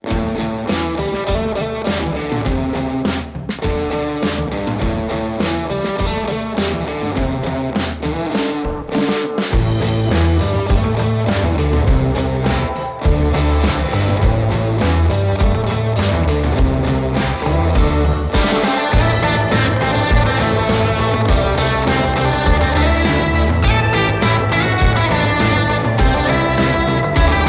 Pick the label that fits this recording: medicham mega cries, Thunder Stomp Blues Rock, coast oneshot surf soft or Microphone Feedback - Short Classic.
Thunder Stomp Blues Rock